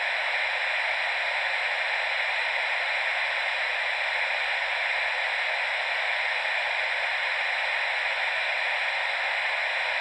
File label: static